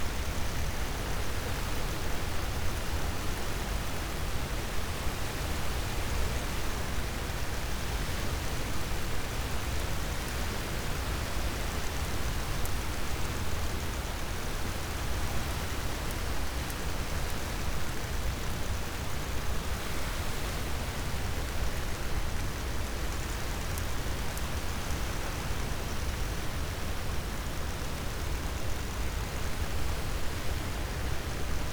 cachalot positif, Physeter Macrocephalus

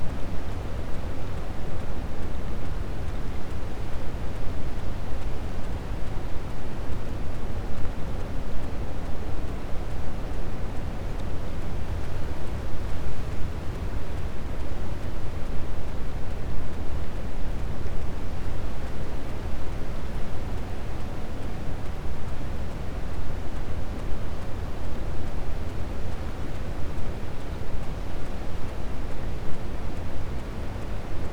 cachalot negatif, Physeter Macrocephalus

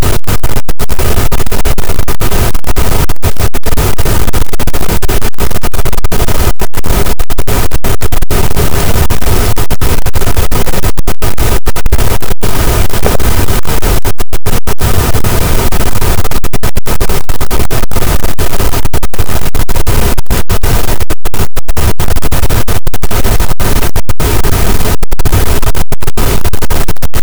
cachalot, Physeter Macrocephalus, gaussian noise

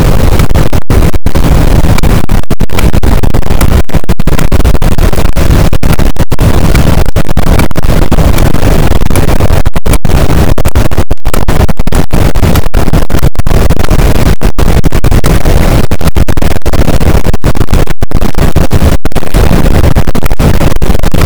cachalot positif, boat; Physeter Macrocephalus

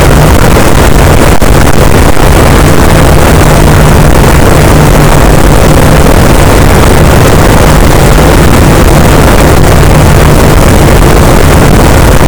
cachalot positif, boat; Physeter Macrocephalus

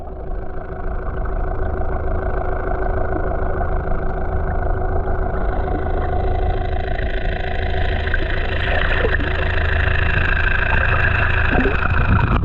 moteur cata eau; bruits profondeur

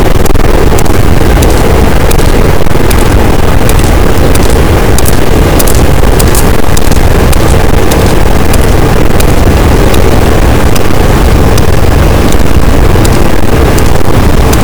cachalot positif, boat, Physeter Macrocephalus

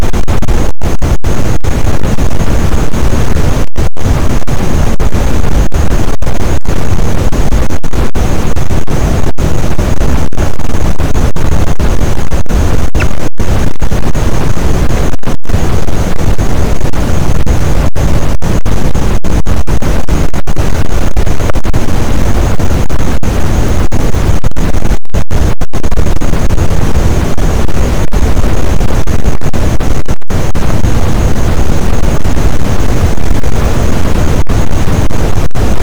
cachalot, boat; Physeter Macrocephalus

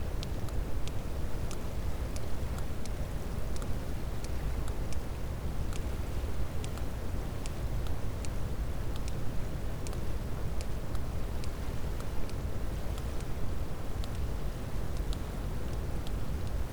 cachalot positif, Physeter Macrocephalus

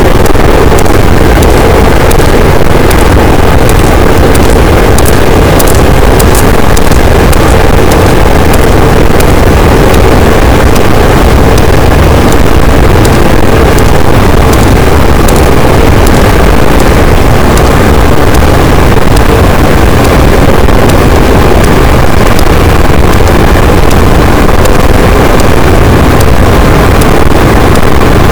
cachalot positif, boat, Physeter Macrocephalus

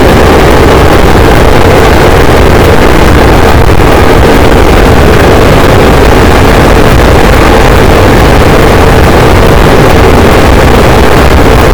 cachalot negatif, boat, Physeter Macrocephalus

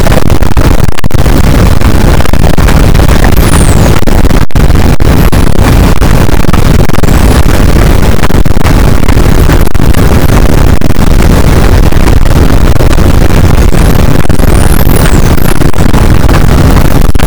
cachalot negatif, boat, Physeter Macrocephalus

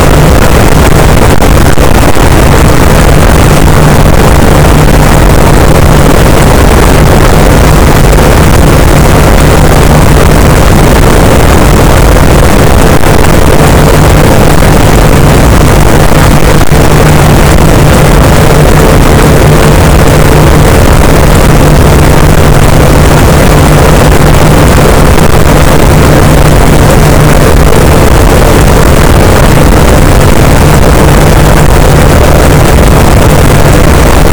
cachalot negatif, boat, Physeter Macrocephalus

